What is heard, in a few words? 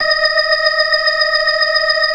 FAST VIB, ORGAN, TREMOLO VIB; Keith Emerson Signature Series